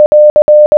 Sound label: plus, morse